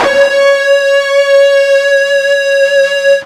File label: FUZZ C#4 L, Guitar; East West; Steve Stevens Guitar Samples Collection